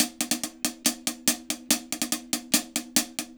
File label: HAT, HI HAT LOOPS, Dub; Drumdrops In Dub VOL-1